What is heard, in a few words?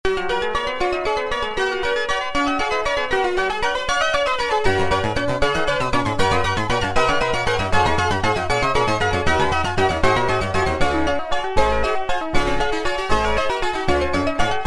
funny remix cartoonic musical tone ringtone